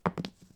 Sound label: die Throw, Casino; Kenney's Sound Pack